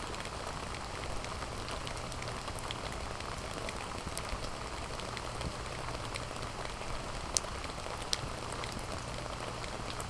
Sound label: rain